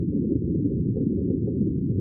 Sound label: water Particle; elements